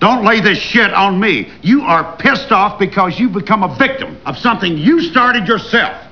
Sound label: awwwwww